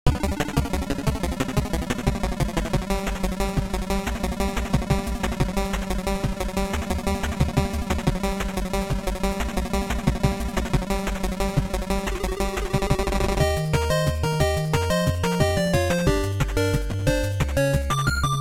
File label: bgm boss